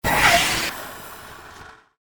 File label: hydraulic door